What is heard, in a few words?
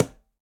Quinto C Heel, Conga, Latin Percussion; Drums; Drum Hits; Ableton; Factory Packs